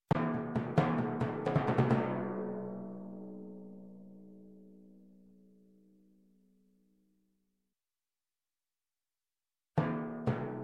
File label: Timpani bridge, Music, Percussion, Drums; Sound Ideas; Series 2000